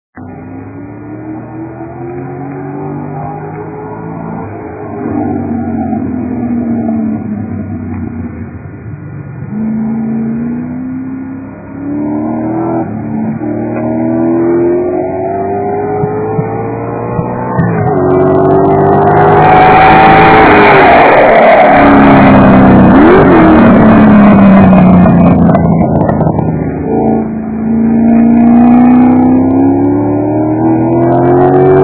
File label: thunder